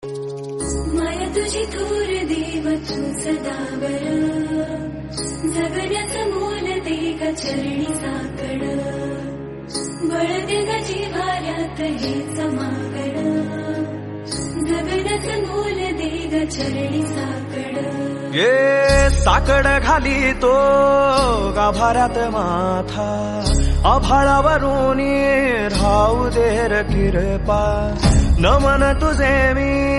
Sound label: bhakti marathi; gajanan deva